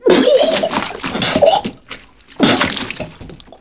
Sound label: womit; Mafie 1